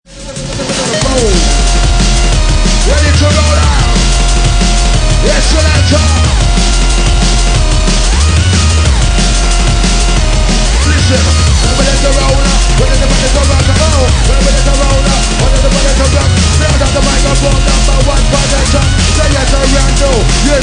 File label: DNB